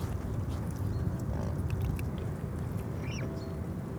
hoeckerschwan

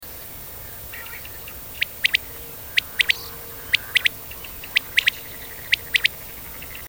wachtel

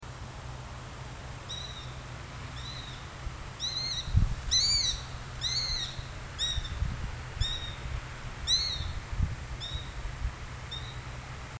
sperber

